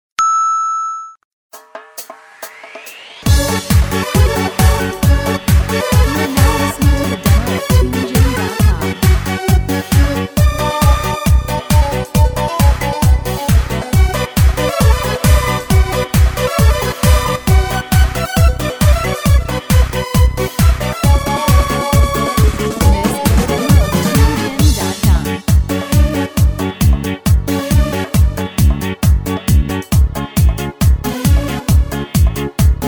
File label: Zheng